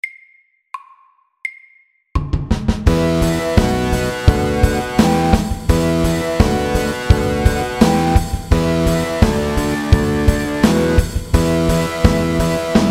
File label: Mountain rock SAKTE